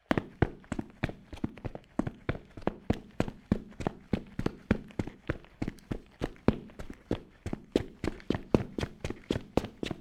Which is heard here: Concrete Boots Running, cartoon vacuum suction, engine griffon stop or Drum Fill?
Concrete Boots Running